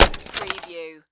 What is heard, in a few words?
Axe Chop Log; sound effects bible